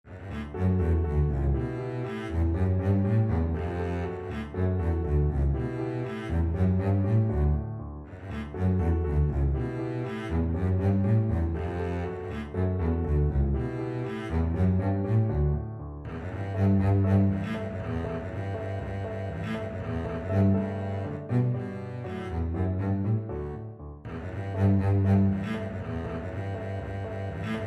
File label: ecossaise beethoven double bass